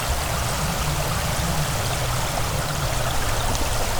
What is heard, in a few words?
cut waterfall earth; Titan